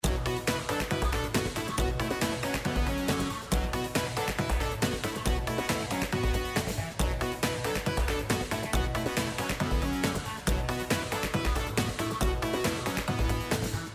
Free Spin BGM Loop; slots; Crazy Rockets

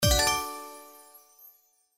Symbol Regular Win High; Chinese New Year Deluxe

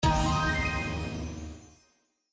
SFX Symbol Jackpot Win; Kungfu Showdown

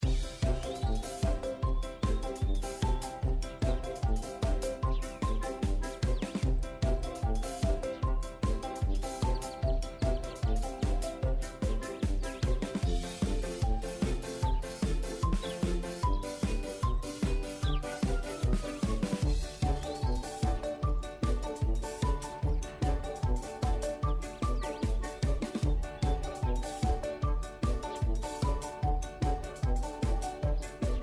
BGM Free Spin; Cash Honey

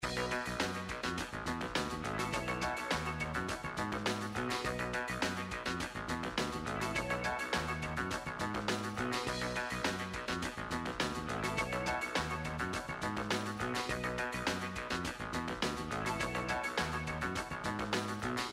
BGM Paytable; slots; Steam Miners

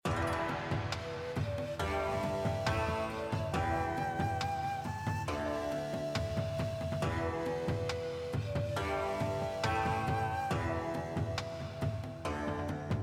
BGM Main UI, SLOTS; Wealth Gods

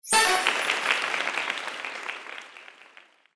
Symbol Jackpot Win; Wealth Gods

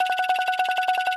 Win Animation Increment; slots; St Paddys Day Blast

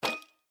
Spin Stop; slots; Wealth Gods2

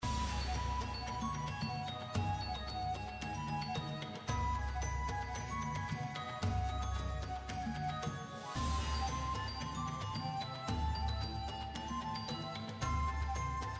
BGM Match For Cash, Chinese New Year; Chinese New Year Deluxe

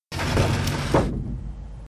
Bonus Tree Drop; Big Paul

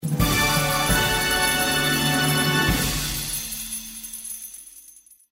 BGM Bigwin, slots; Panda Majesty2

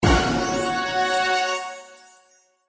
Symbol Bonus Win; Realm Saga

